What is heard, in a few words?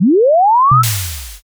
warp jump